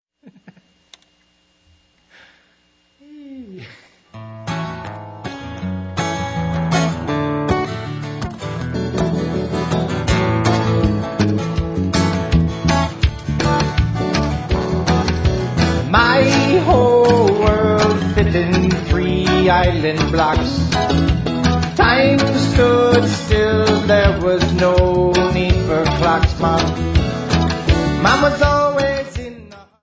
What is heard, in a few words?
Reggae